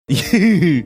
funny laugh

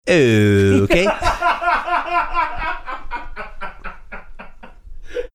funny okay with laughing

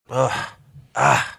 ugh aagh

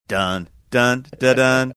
singing few notes

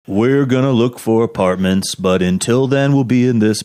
singing we're gonna look for apartments

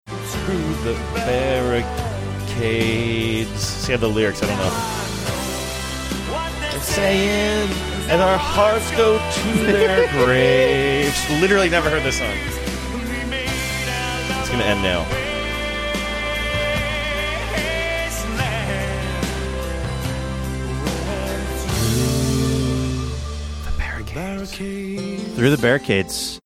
singing along

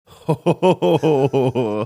chuckling

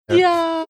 making contemplative helm scream sound

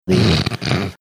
snorting

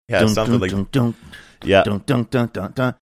singing ad song; beginning home depot

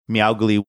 meow

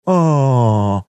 awww

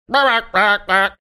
making squawking sounds

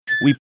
squeaking sound

